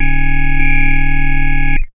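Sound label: first firing warning; ambient; launch; Half Life 2; Episode 2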